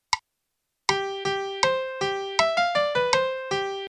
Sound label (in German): melodie; MelodiediktateII